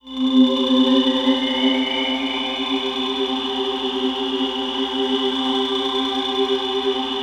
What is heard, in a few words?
TUMBLING; ENVIRN-AMB